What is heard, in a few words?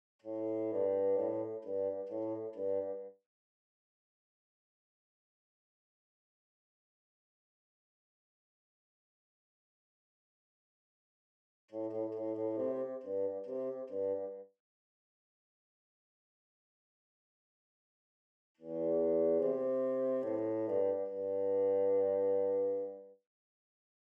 Bassoon; Gnome forest